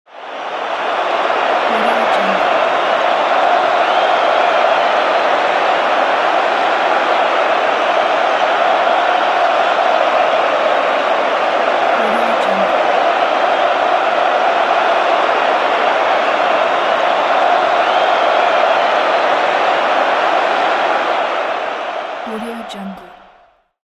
soccer game crowd sounding

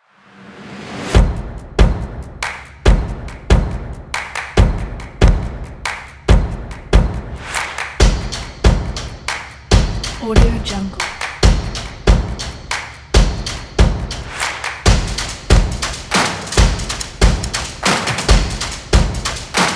energetic sport percussion stomps claps

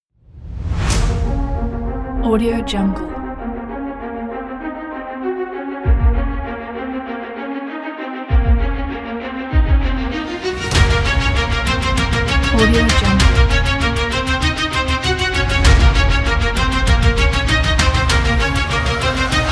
dramatic action sport big beat kit

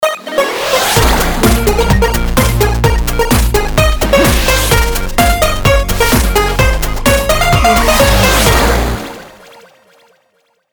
8-bit chiptune logo reveal

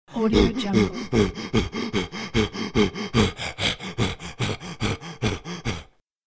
cartoon giant running breath

